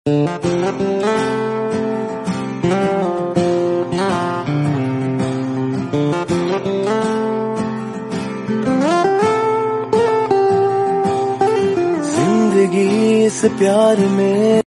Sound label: bollywood love song bgm ringtone